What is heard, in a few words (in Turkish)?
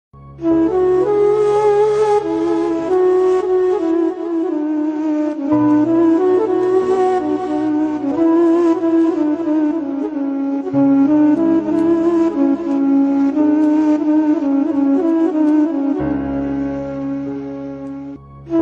islami fon muzigi